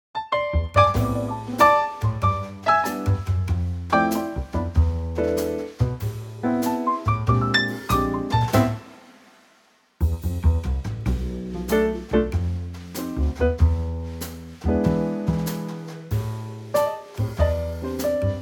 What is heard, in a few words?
Trio C